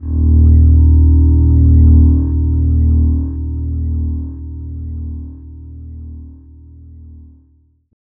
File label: Bass; Sonic Foundry (Sony Creative Software); Not Just Another Pretty Bass